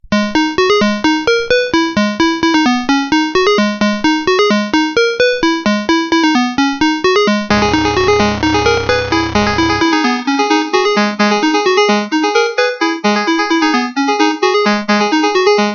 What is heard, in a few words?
Hybrid Song LSDJ